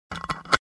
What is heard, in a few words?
Jar lid closing